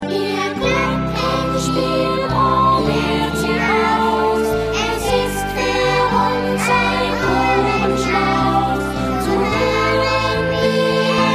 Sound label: Glockenspiel; Strahla